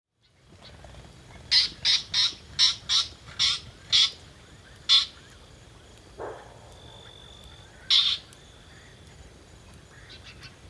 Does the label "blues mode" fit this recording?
No